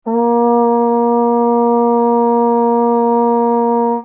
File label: Вувузела (Vuvuzela Single note)